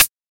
Royality free hihat tuned to the F note. Loudest frequency: 761; smooth hi hat sound f key